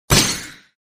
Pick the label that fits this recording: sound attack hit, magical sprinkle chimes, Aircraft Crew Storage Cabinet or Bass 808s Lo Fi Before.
sound attack hit